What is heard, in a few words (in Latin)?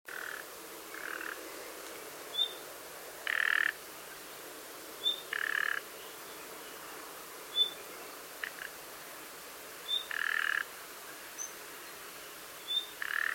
Luscinia megarhynchos; SETE